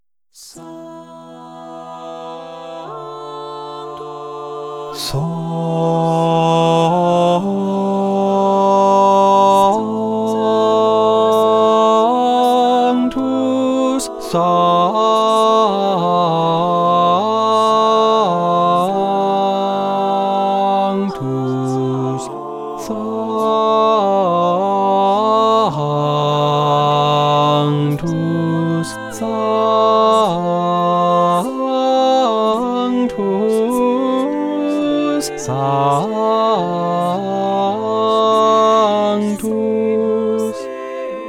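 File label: 2nd TENOR mass; HOSANNA Cappella Sistina MS 17 “Missa Mille Regretz” (Morales); cristobal morales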